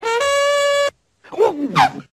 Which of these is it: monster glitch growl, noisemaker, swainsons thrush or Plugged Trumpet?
Plugged Trumpet